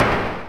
portalgun bullet; minetest

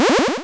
teleport sound

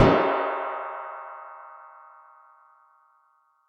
cannons dig; minetest

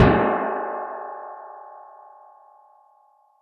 cannons dig; minetest

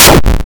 explosion; GeoJam2021

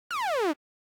laser; minetest; rangedweapons

